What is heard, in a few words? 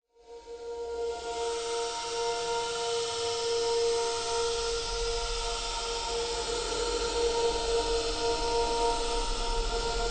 instrumental coda